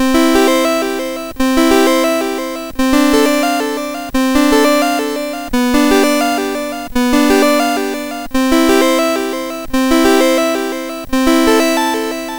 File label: Praeludium, MOS6581R3